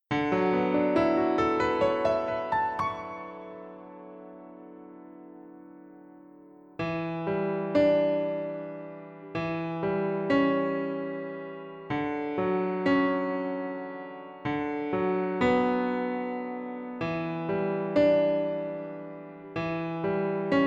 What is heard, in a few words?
Piano ver